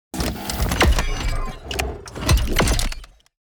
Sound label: Sci-fi gizmo metallic sequence futuristic